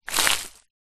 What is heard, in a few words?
footstep forest floor crunchy leaves; foley